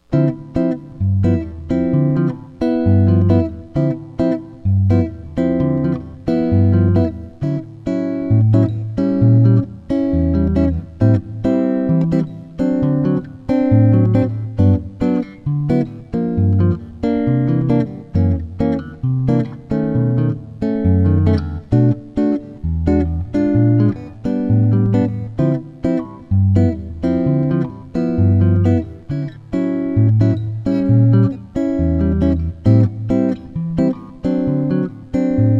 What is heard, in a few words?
Bossa Fingerstyle Rhythm; jazz tunes